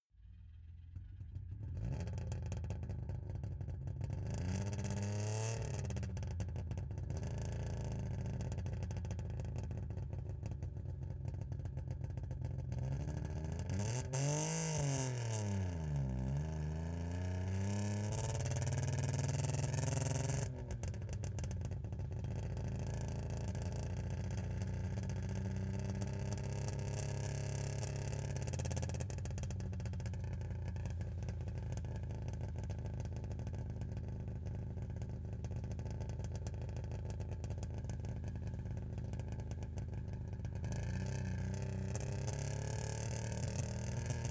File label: car saab 92 drive slow exhaust